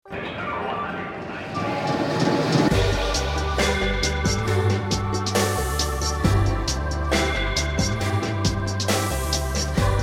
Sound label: dreamy score; Coca-Cola